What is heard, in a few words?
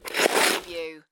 Snow and Ice, Ice scrape moderate